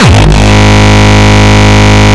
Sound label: Longkick, kicks